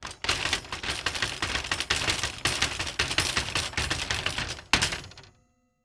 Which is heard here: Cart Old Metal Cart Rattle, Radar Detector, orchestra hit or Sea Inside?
Cart Old Metal Cart Rattle